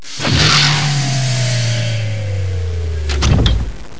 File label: diffuse